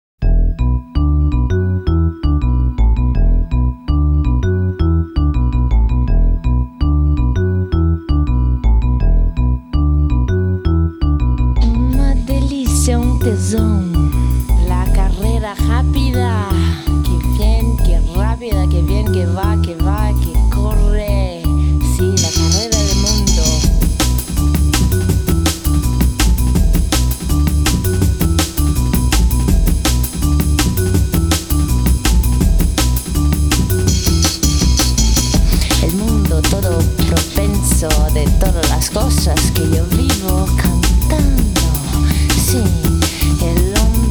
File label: Drum & Bass Orchestra Voice-Over Version; Carrera Rapida; The E-Z Drum & Bass Orchestra Voice-Over Version; Rapid Racer 1997